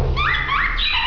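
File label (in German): erstaunter pfiff, wellensittich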